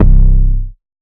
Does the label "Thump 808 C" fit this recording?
Yes